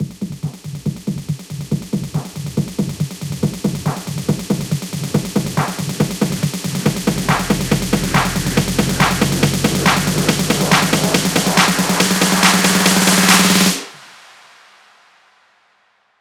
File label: 140BPM 8Bars, Hands Up Trance Fills; FunkyB; Vengeance Samples